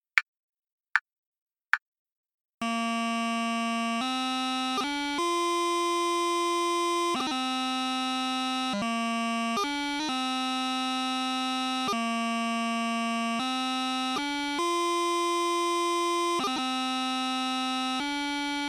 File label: clicks 66bpm; Suo Gan